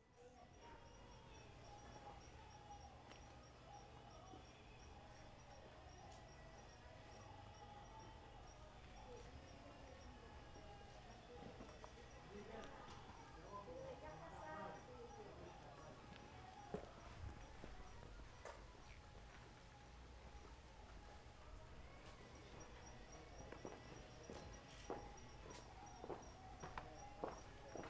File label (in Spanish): AMB INT TARDE MUSEO Generico pasos; Carlos Gardel UY